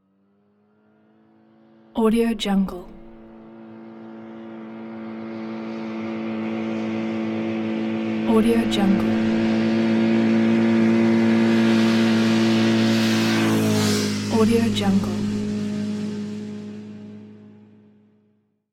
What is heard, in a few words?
motorcycle approaches and passes